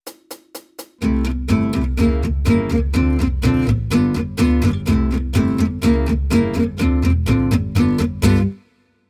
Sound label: Jazz, Guitar